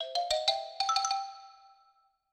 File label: licks